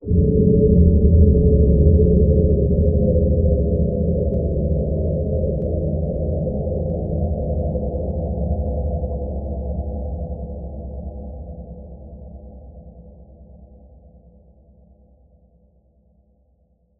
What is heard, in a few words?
Impact cinematic rumble dark bass with mournful moaning tones slow fade